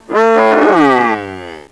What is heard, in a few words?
OOM